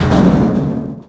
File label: Break Nut; Finding Nemo; XBOX